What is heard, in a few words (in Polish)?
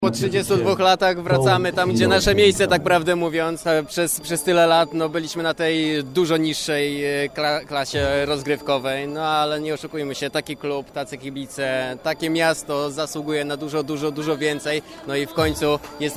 kibice; motor